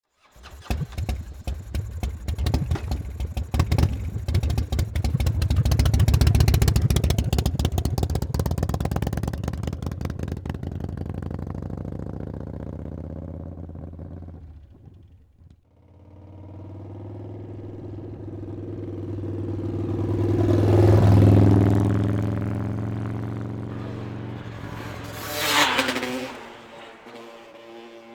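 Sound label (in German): MOPED, Stadtgeräusche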